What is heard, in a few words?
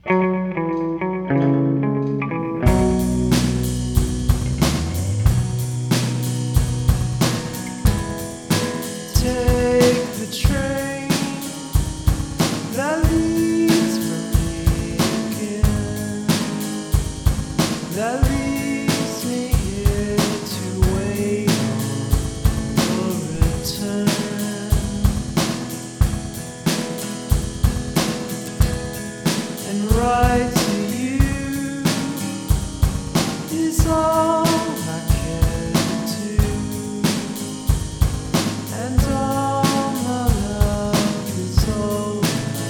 gtr solo; Engineer